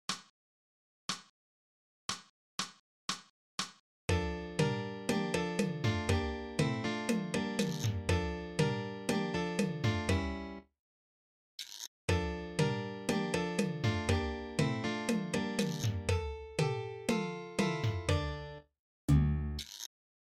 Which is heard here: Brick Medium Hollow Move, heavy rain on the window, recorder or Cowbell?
Cowbell